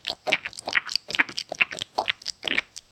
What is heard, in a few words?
cartoon eating sound of dessert